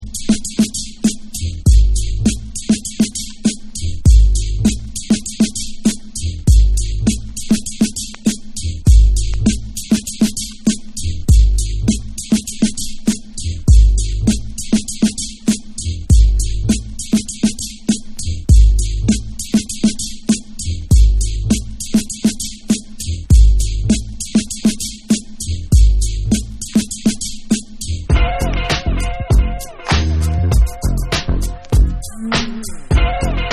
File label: Long Instrumental